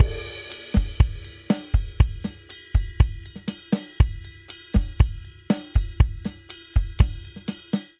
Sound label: Bossa Nova Chorus 120 bpm, Drum; 4 4; MIDI Clips; Ableton; Factory Packs; Drum Booth